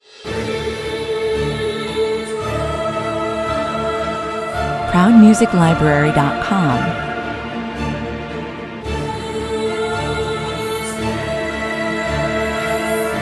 no percussion main

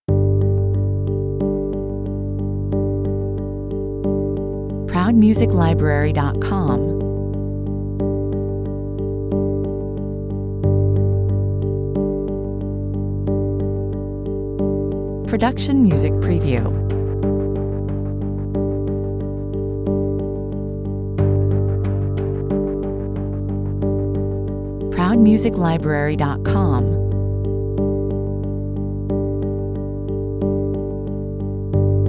no lead perc

